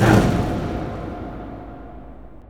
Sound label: fire spirits launch; weapons; dota; phoenix